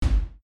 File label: ui click, sci-fi